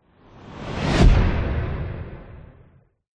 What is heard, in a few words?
sound design whoosh heavy hard fast impact; zapsplat